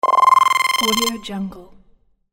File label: buzzer